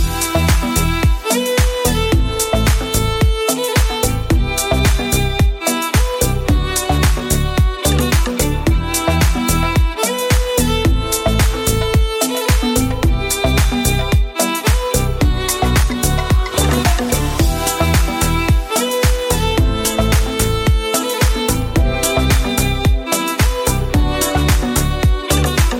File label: Romantic Ringtone; Samsung